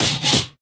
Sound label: taunt bunnyhopper hop; video games; source engine; tf